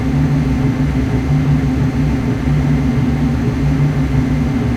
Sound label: whine